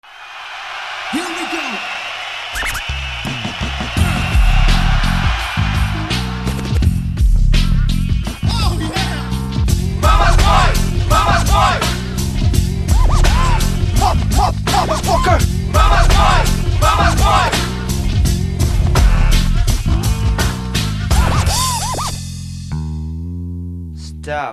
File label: Jingle